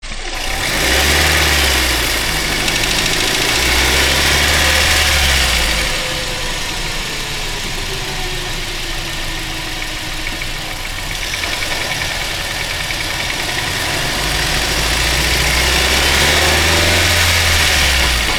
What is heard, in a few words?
engine ticking clicking noise under load; 1st generation gd 01-08